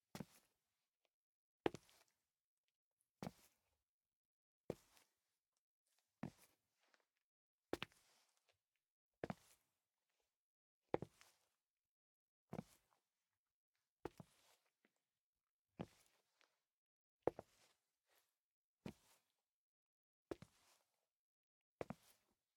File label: Footsteps; LOOPERS; Tyler